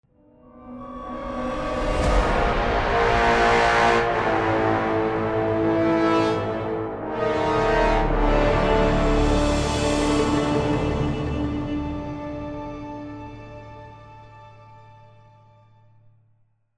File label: lose; radiant